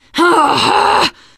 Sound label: negative noise, teen girl, survivor voice; Left 4 Dead; Source Engine